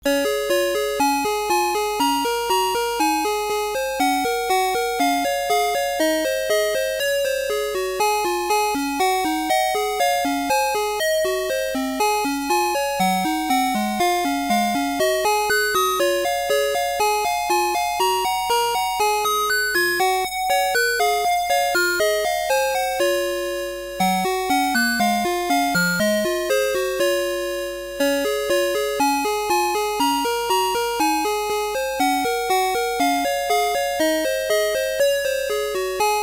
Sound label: Chord A